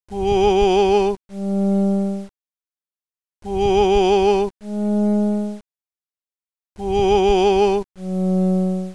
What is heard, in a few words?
vibrato